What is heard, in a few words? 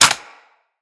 Weapon Jam Hit; Weapons; Grineer